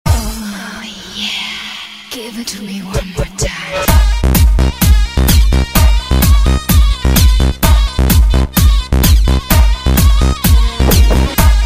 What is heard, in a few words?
Oriental House